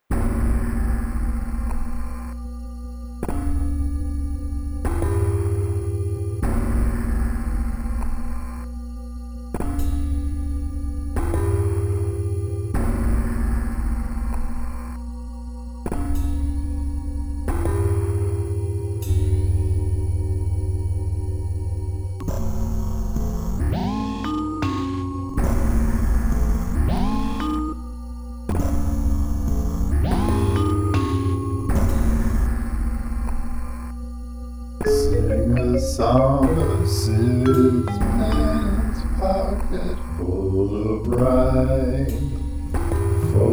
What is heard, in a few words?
experimental improvisation